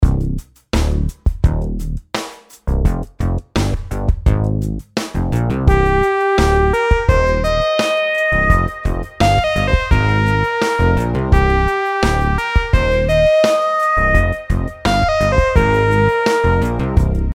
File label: rhythm simple 8s triplets